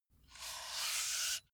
metal pole slide

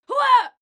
female fight scream hua; schreie; menschen

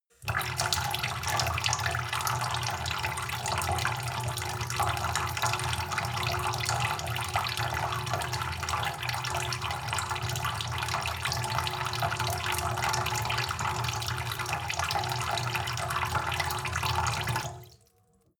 sink fill with water soft drip long; industrie-wirtschaft; gastronomie

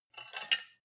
cutlery take from plate; berufe; gastronomie; soundpool

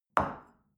shell place on table medium; soundpool

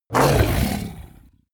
coloss groan pain; kreaturen

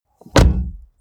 car bmw e46 exterior door front close hard; Cars

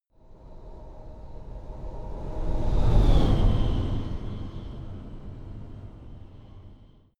sci-fi vehicle train passing long; raumschiff

